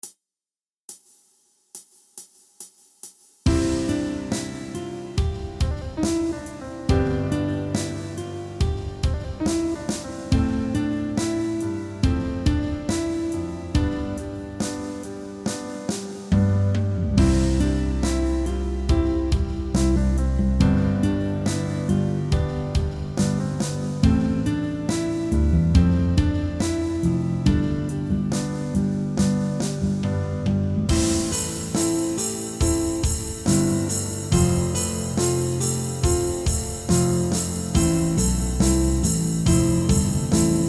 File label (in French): Autour de la gamme de do